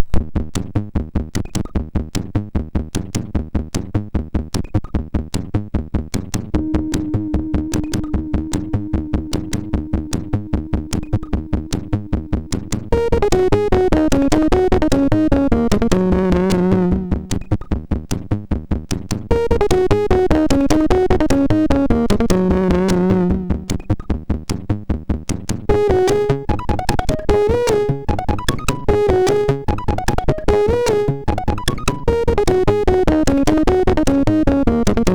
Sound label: sid MOS6581R4